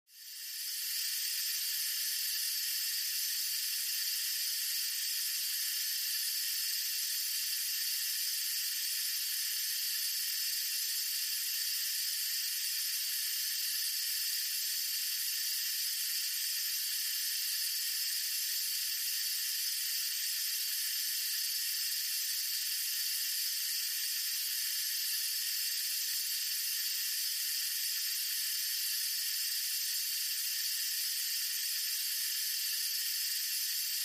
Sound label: Gas Leak C Stdy Fuel L